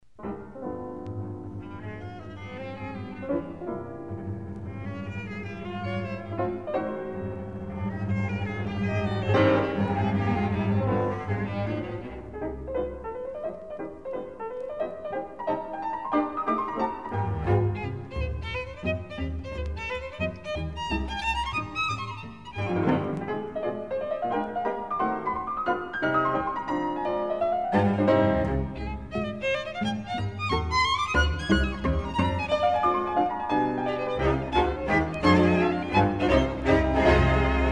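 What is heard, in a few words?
piano quintet; goetz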